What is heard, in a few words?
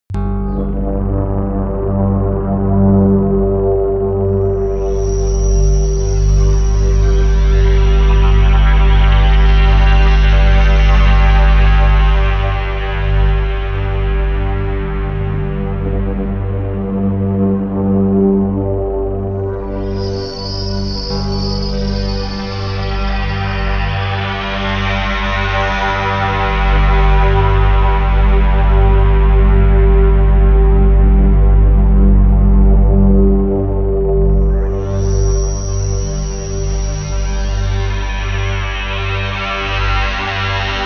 moving bass; flaechen